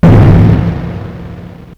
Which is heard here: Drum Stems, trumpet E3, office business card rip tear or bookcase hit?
bookcase hit